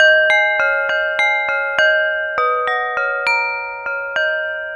Corning Bowl; Ambient; Melodic; Ambient-Melodics

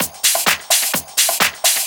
Discohouse Loop 128 BPM

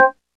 Organs, Org-Ham; Club-50 - Foundations Roland

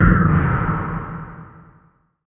Trampoline Accident; Zero-G - Ghost In The Machine

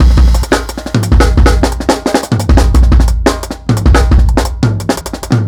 Run Beat 4-Bar, Drum n Bass Beats; KJ Sawka; Drum n Bass & Beyond

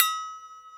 Looped Flexi; Mo' Phatt; Digital Sound Factory Mo' Phatt

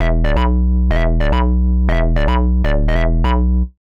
Hard Synth Bass B, 125; PowerFX - Nu Jazz House

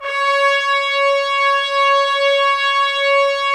BRS TPS, Trumpets; Roland - LA Composer vol. 4

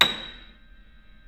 A#6 R, Keyboards Acoustic; USB Soundscan; AKAI

